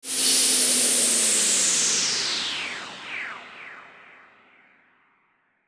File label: Sweep, jingles